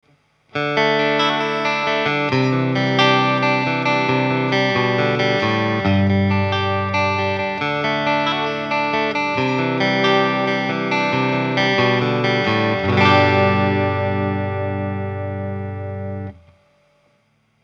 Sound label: clean; Celestion Rocket 50